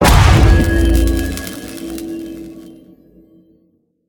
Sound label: fire; Smite; god Khepri